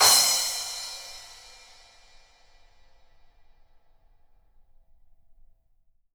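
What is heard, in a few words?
cymbals room; Q Up Arts; Denny Jaeger Private Collection; CYMS GM ROOM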